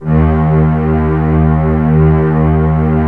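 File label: M1 STRING D#1; Northstar - New Gold VOL-1; M1+D50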